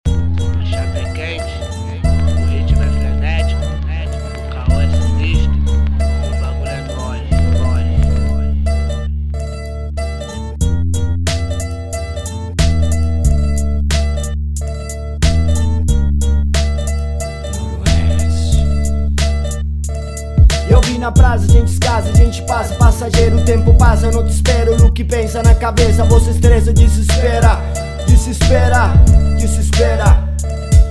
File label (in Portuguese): vozes internacionais intro